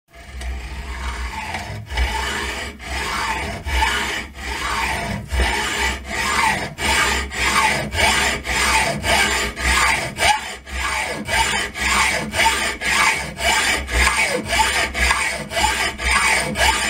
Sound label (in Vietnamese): tieng cua sat thep kim loai bang tay